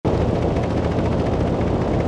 Walk

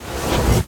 Charge; Recruit Ulta; Folk Hero

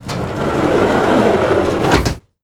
Close Sound; Mars Sortie

